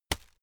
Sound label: hit plastic fleshy soft